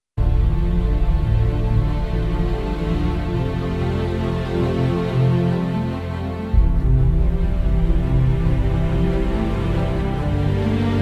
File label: A Music Bed